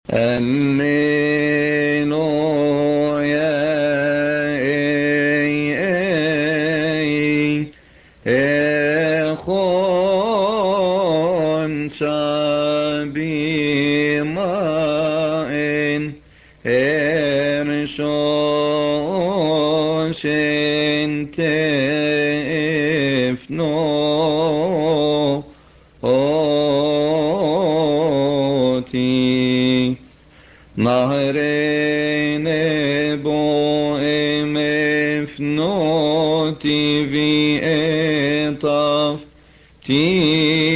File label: Lent Days Alleluia E-A-E